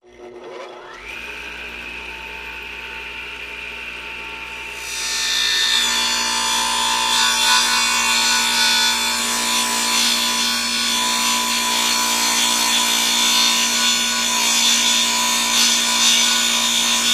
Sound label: Tools Circular Saw